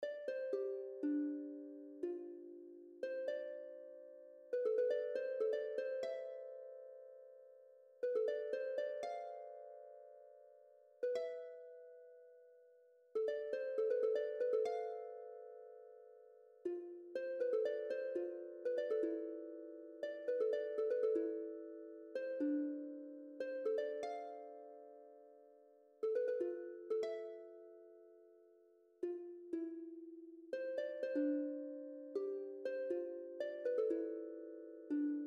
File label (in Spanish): Arpa